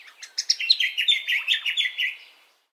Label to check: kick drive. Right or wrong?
Wrong